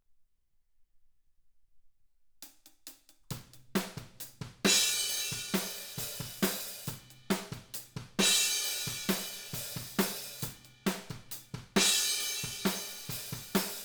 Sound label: OHS